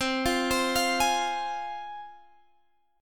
C Suspended 4th Sharp 5th, C, guitar chord

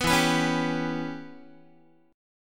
E Minor 6th, Guitar; Em6 strummed; 14,12,14,11,11,x; JGuitar

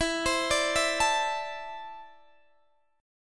E 7th Suspended 4th Sharp 5th, Guitar, DADGAD

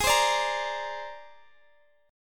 C 7th Suspended 2nd Sharp 5th, Ab

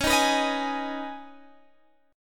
Db 7th Suspended 2nd Db, down, Guitar Standard; JGuitar

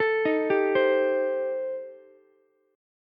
A Minor 7th Flat 5th A Ukulele, chord; Am7b5 arpeggiated; 14,15,15,15

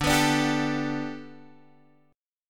G Major Add 9th Guitar Standard, chord; Gadd9/D strummed; JGuitar

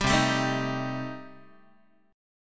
C Minor 6th C Guitar; Cm6 strummed; JGuitar

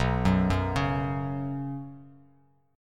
B Suspended 2nd B Bass Standard, chord; arpeggiated; JGuitar

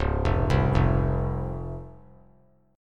C sharp Major 7th; arpeggiated; chord

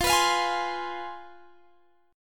C Major 7th Suspended 4th Sharp 5th, guitar

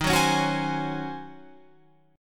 Eb Minor 13th Eb down Guitar Open F; Ebm13 strummed

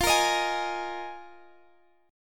Bb 7th Suspended 4th F, chord

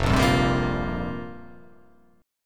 F Suspended 2nd Suspended 4th F down

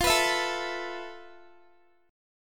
F Major 7th Flat 5th, F, down, Guitar; strummed

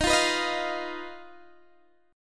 Eb Suspended 2nd Sharp 5th, Guitar, DADGAD; strummed; chord; JGuitar

